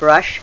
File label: brush; myabcs